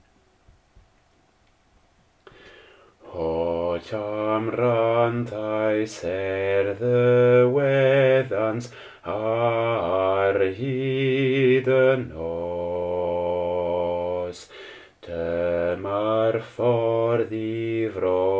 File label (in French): basse